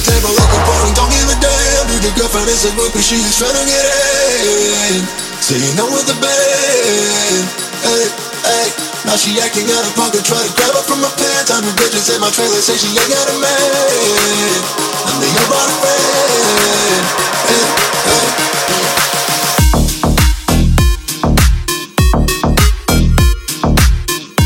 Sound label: ringtone